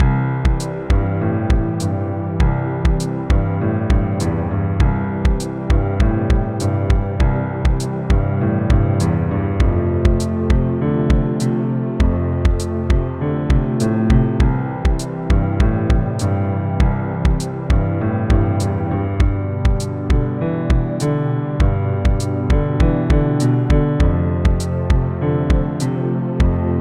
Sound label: Rhumba simple